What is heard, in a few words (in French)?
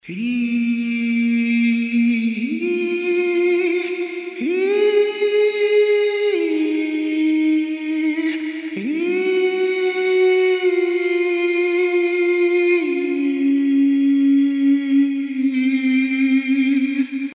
voix